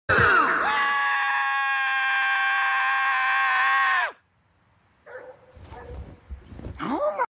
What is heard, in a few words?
scream; homer